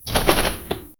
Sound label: small skeleton walking in, dungeon